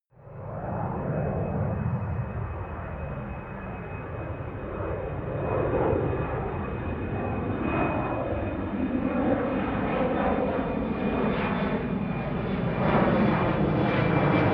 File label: plane flyby land tonal howl